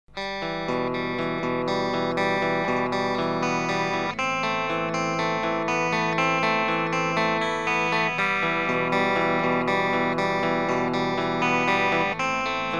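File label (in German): Horizon Akkorde gezupft, ESP Horizon, Clean Akkorde gezupft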